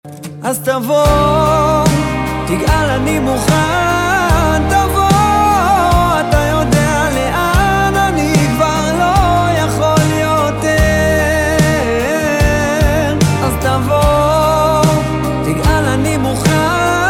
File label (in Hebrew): צילצול לטלפון